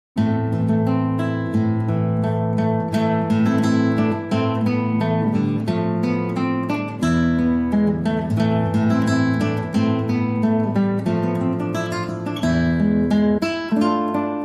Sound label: Solo Guitar